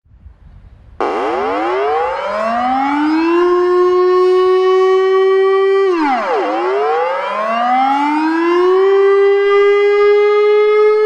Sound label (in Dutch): nederlands luchtalarm